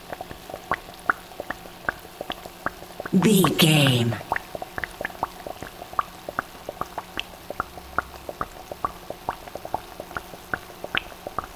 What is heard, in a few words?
boiling bubbling water gurgle